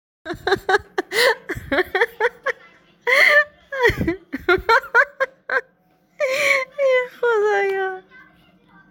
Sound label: Laugh